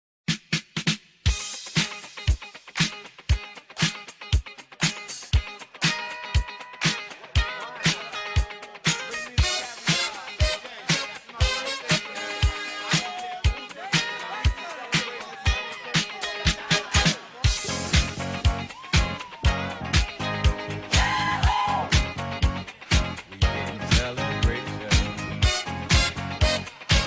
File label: celebrate